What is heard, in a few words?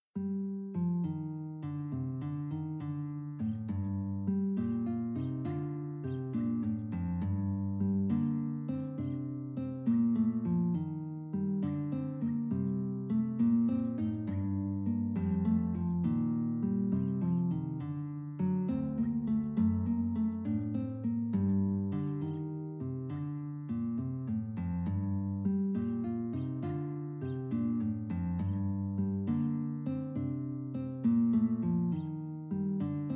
six bar blues